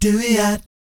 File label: DOOEEOT, Vocal Jazz Fx